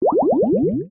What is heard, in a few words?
game over